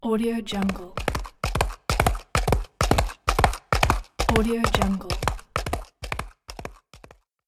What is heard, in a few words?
horse running by